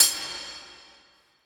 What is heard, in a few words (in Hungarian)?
bogre conv